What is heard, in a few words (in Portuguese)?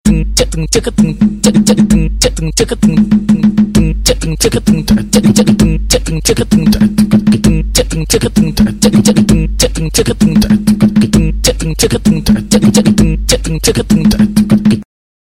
beat box